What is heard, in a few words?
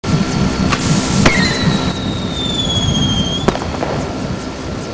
Propane Tank; Infiltrating The Airship